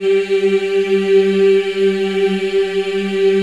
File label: G3 MW HI, choir; USB Soundscan vol.28; Acoustic & Synth; AKAI